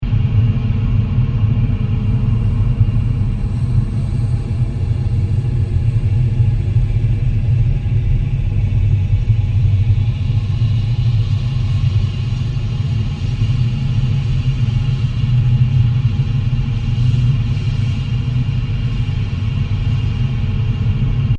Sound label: Scary Atmosphere 090bpm, scary soundscape; Scary Soundscapes (Sound Effects:Horror); Crafted Signals